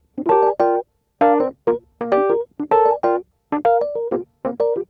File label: Smooth Groove Rhodes, 70s Analog Funk